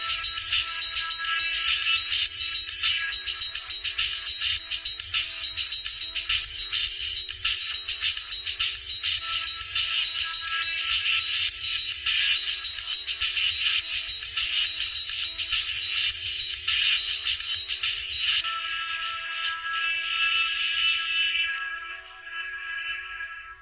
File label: Nokia Ringtone Mobile; Mobile 3.2 Original